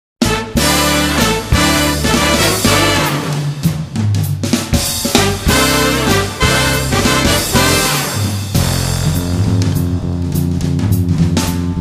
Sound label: jazz